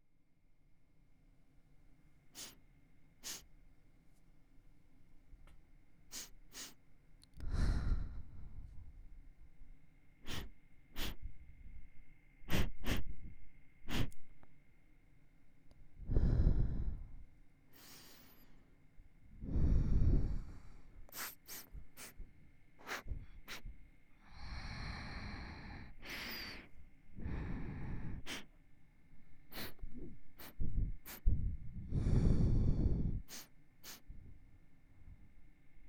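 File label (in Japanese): 耳舐め 右（キス、耳嗅ぎ、耳舐め、耳まぜ）, NoTalking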